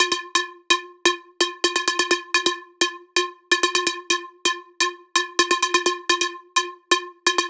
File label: Piano Melody Beat Loops